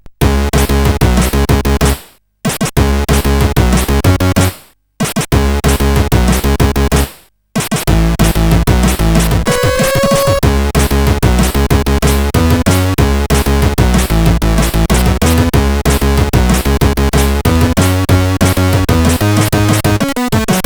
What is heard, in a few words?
CSG8580; Speedtro Tune